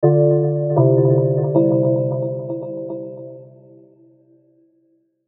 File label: Logo Low Chords Filter Delay; Sonic Logo; Blastwave